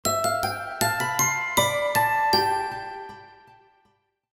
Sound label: Lalalah Notification; HTC; Wintergreen